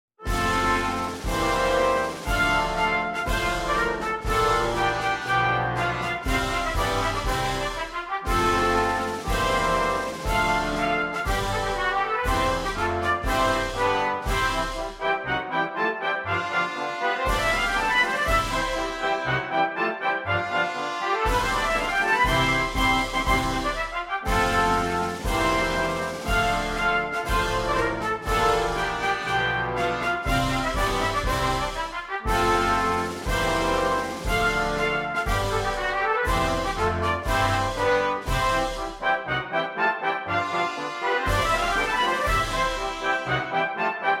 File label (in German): Marsch